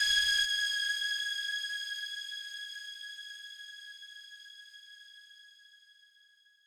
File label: lead